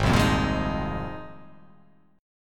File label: G sharp 6th Add 9th; 4,3,3,3,5,3,5,x; down; chord shape